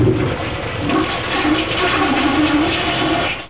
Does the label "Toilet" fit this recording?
Yes